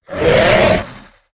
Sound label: Hungry Dragon Attack